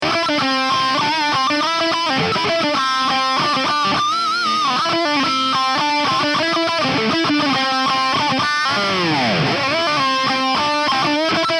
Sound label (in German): glücksmelo git